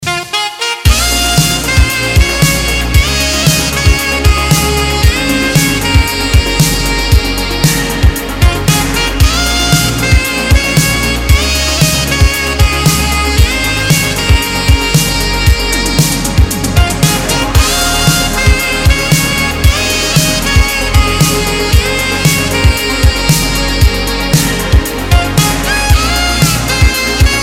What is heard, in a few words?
romantic saxophone